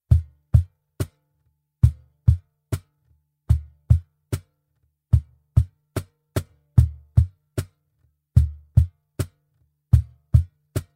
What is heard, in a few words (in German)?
Rap Playback